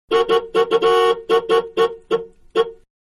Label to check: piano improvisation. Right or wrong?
Wrong